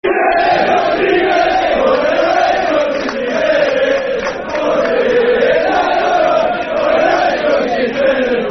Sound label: Football Chant, Swindon Town chants; When The Red's Go Steaming In; Swindon Town Stfc